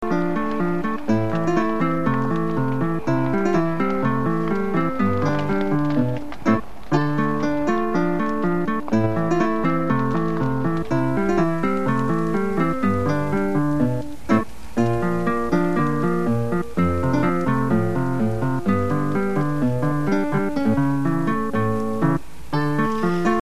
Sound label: stalker lute; dwarf minstrel who is plucking his lute and playing